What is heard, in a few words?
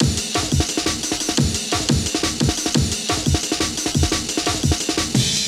amen; Amen Breaks